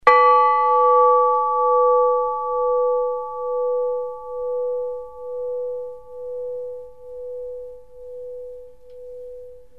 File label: russian bells; zvons; Pyatkov